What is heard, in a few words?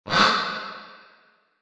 duck concert; reverb demo